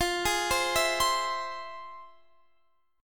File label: F Minor Major 7th, Guitar Standard, chord; FmM7; x,20,18,17,17,20; arpeggiated; capo=2